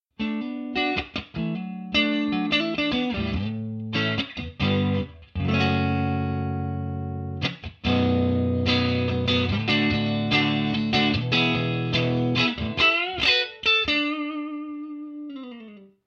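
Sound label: clean, guitar amp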